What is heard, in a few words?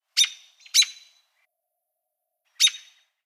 bird; Counter-Strike Global Offensive